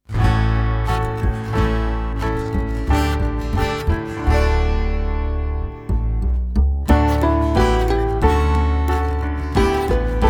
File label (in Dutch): ABC instrumentale